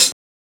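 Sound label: closed hi-hat, disco; Best Service ProSamples vol.48 - Disco Fever; AKAI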